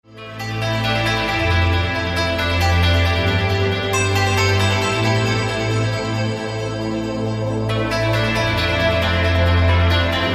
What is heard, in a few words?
club mix